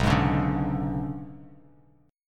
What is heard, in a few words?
B Minor 6th Add 9th B down Bass Standard; Bm6add9 strummed; JGuitar